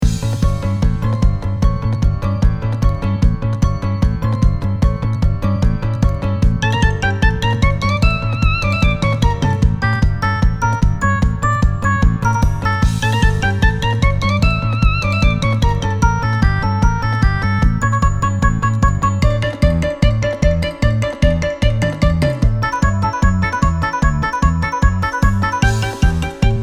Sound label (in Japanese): 追いかけっこ